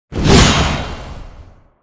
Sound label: Reaper Attack